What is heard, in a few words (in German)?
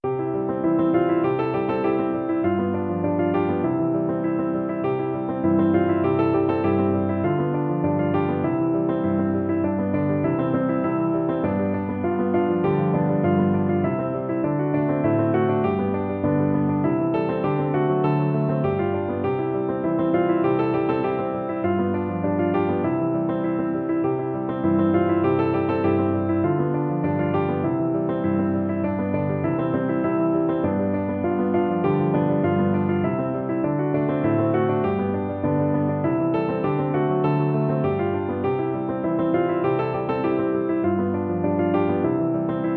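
Kling Glöckchen Weihnacht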